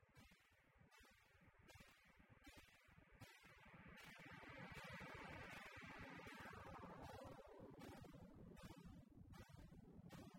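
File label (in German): Loop Hüllkurve mit Filter und Ringmod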